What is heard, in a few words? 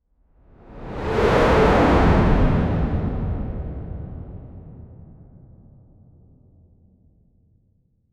wide windy sky swirling, metallic whoosh